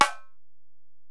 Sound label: Ac Perc, Drum Machines Alesis DM-PRO